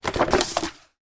poster roll up fast; foley; sound effects; sound effects one

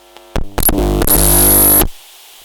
glitched tones Cable FX

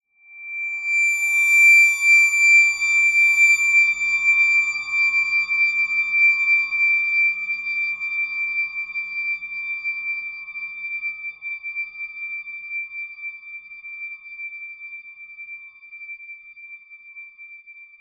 horror whine shrill high pitched; zapsplat

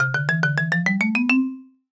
comedy marimba ascend; sound effects one

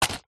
medicine lozenge pack set down table; strepsils

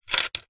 toy plastic gum ball dispenser handle turn

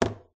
phone drops on wooden table; your free sounds

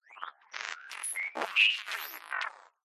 glitch digital; sound design

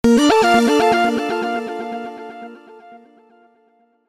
multimedia alert synth delayed warm

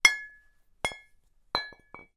sport cast iron weights x2 band together

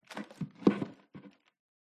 glitched tones household attic loft door open

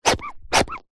leisure toy rubber duck squeeze no squeak

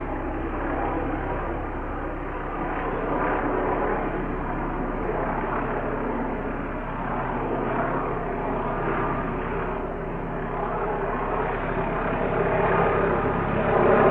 transportation aircraft helicopter hover overhead; sound effects one

